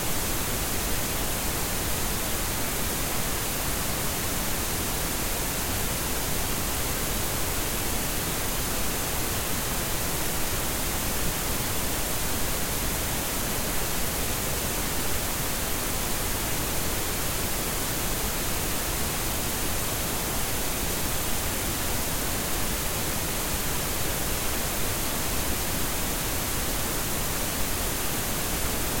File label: Pink Noise